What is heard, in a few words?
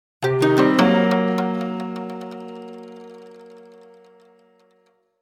Fail; Bouncy Bob